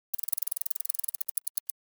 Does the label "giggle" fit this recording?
No